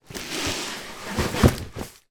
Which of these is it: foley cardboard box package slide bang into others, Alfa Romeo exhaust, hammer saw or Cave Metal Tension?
foley cardboard box package slide bang into others